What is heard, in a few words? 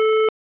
sniper scan; tf; lucy